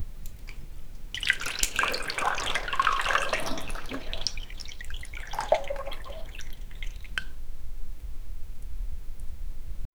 the sound of water moving

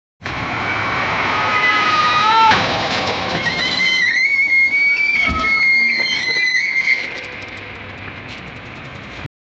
car honking followed by a

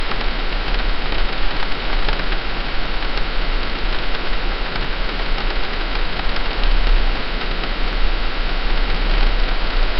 cozy rain on wooden cabin

